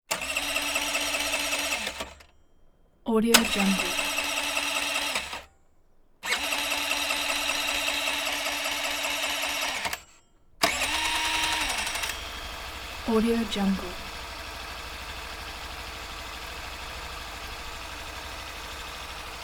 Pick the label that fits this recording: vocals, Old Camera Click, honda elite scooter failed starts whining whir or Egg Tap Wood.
honda elite scooter failed starts whining whir